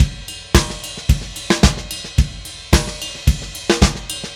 Drums, Indie Rock; Session 01 - Brighton; Sonic Foundry (Sony Creative Software); Indie Rock Anthems

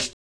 Snare2, Casio Rapman-II

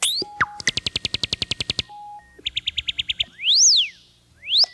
Pro One Bird, Keyboards

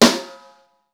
snare, Roland

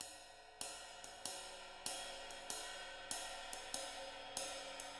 SWING, living drums; Sampleheads; Peter Erskine

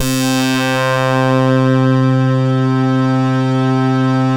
KORG DELTA, LEADS

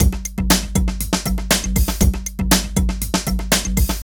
Drum Loops

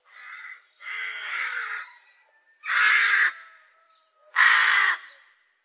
Birds Various, Birds

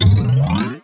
Ruler Twang, cartoon Foley Fx; musicradar; cartoon caper

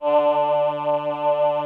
VP CHOIR, VOCODER; Greytsounds VOL-1

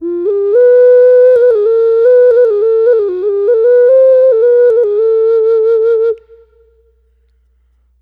Gourd Occarina

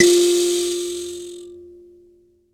MBIRA F4; E-MU Producer Series Vol. 2; More Studio Essentials; Composer

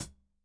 HIHAT EDGE, ELECTRO KIT

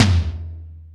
power tom; Greytsounds; Scott Peers Sound Engineering

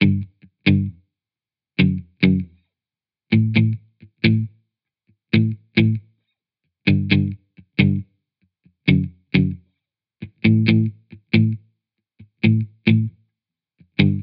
Guitar Bpm135 Key D, 70s Guitars; Fox Samples - Back to the 70s Guitars

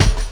BD, Dark Side Of TripHop

